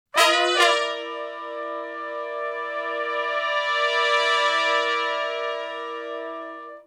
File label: Shuffle Blues End Chords [bII, I] (C), Horncraft for R&B; Sections; Crimson Blue and Fabulous Horncraft 4 RnB